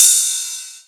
Ride Cymbal

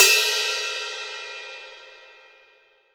ROCK 18 CUP, RIDE

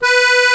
AKORDEON B 2, ACCORDION

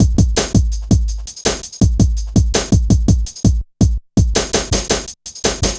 Ezee Rolla, Drum Loops

